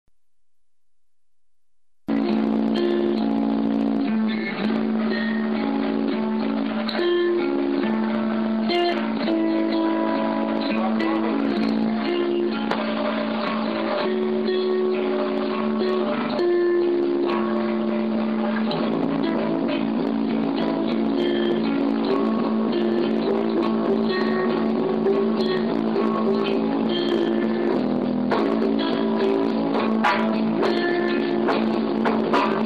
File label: jam